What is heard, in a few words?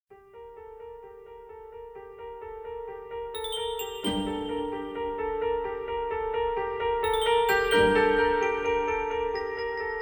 erhu & drums